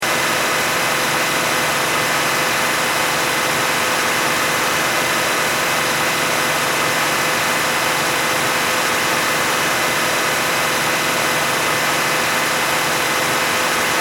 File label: Car Mercury Sable Medium Rpm Loop, Engine Loop; Power Car sounds